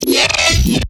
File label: glitch